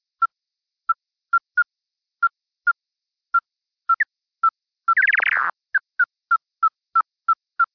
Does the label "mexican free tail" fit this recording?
Yes